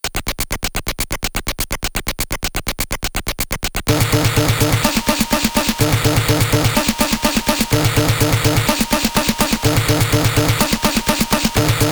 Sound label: dj loops; weeklybeats-2020